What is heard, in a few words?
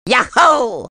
YAHOO